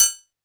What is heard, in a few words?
technox perc; Quasimidi Technox